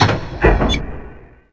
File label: start; WhiteOut